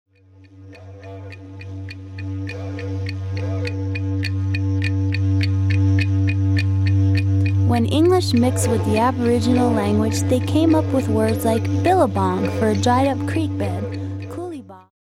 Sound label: Didj